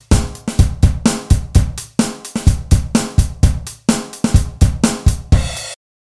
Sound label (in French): Batterie; fete de la musique 2017